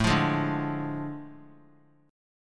A Major 7th Suspended 4th Sharp 5th; AM7sus4#5; strummed; JGuitar

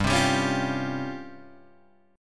Db Major 7th Flat 5th G, chord

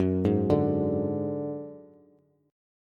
Gb Suspended 2nd Sharp 5th, Bass; arpeggiated; x,9,6,7

